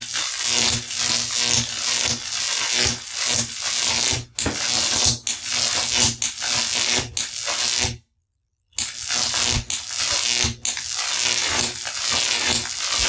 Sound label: Jacobs Ladder